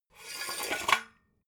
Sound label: metal scaffold pole extend hard; industrie-wirtschaft